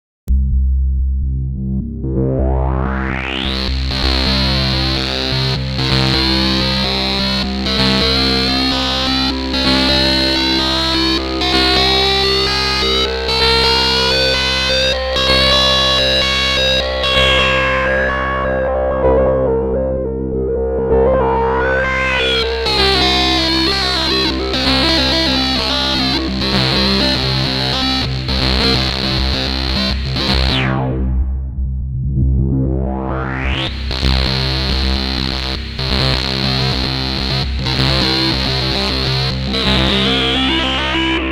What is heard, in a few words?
wave sequencing S&H LFO